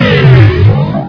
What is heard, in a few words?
attack, baby panther; svencoop; custom monsters